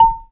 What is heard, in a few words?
marimba A5, Ghana Marimba